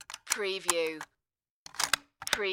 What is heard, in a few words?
Rifle Bolt Action Insert Round And Rack